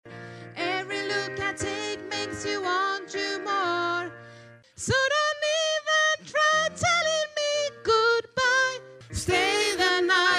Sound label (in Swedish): sopran